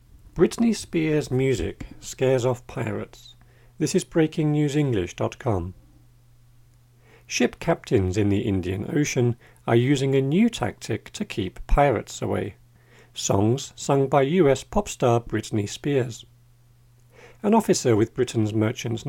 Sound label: loud music